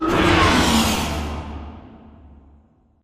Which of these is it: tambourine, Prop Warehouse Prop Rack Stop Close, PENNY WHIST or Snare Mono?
Prop Warehouse Prop Rack Stop Close